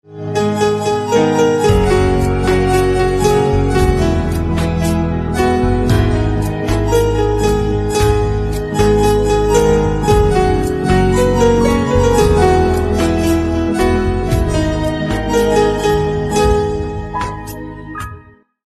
Piano Instrumental Ringtone

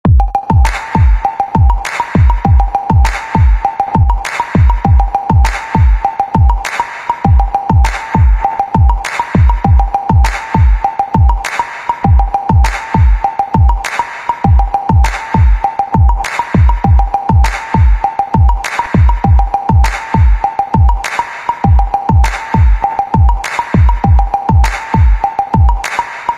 Technology Xylophone Instrumental

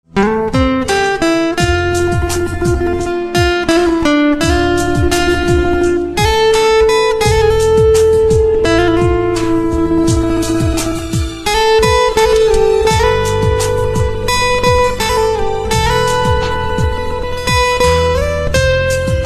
Guitar Instrumental Ringtone

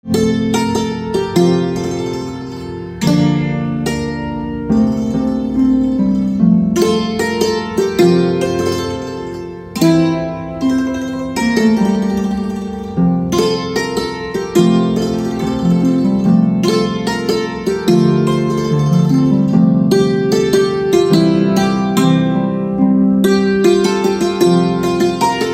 Santoor Instrumental Ringtone

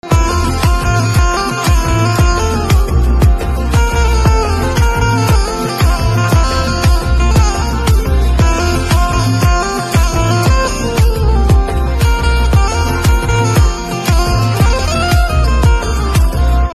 Banjo Ringtone

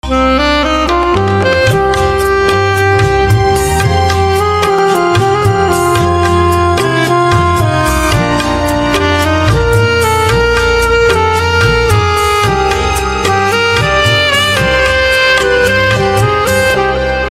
SAXOPHONE Ringtone

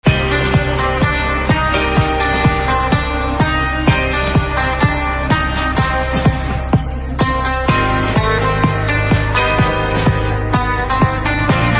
Guitar Instrumental Ringtone

